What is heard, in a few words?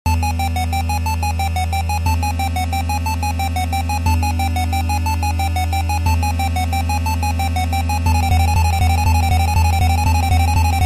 Crisis Junction Jingle